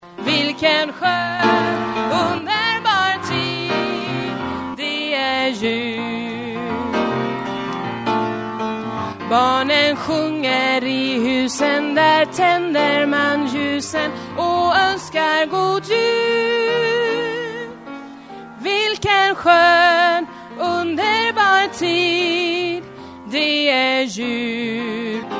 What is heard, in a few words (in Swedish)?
alt, stammor